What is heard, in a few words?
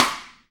Sidestick